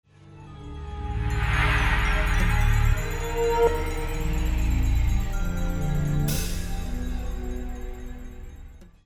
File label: MUSICAL